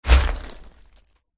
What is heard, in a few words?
dragon blue attack contact